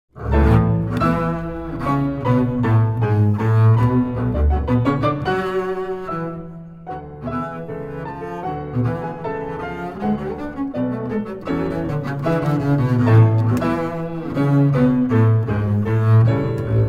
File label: bass son